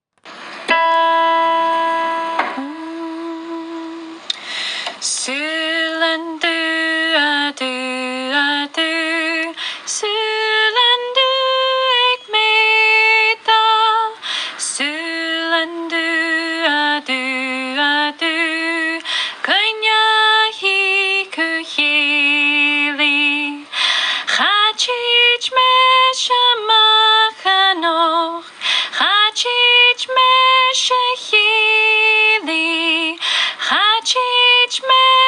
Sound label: singing; Suilean Dubh